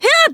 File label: kiai; MonsterHunter; Fight